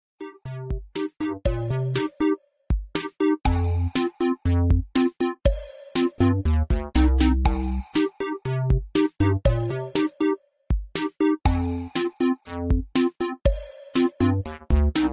lobby music; kahoot

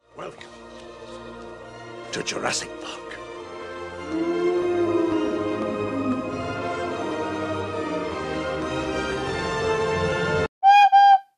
fail recorder

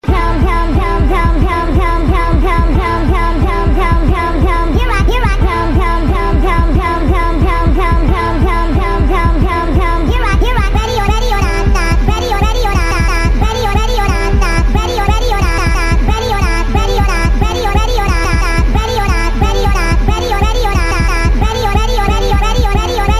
shake instrumental

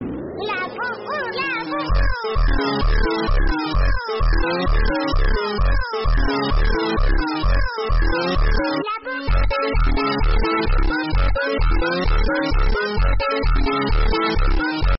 funk; labubu

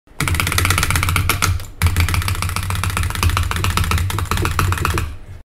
mouse click spam

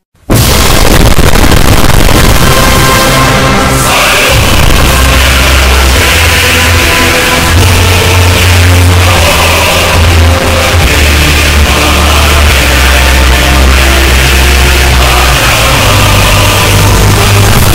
ussr anthem earrape

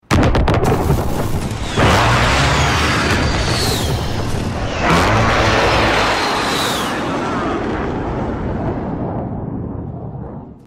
airstrike; warzone

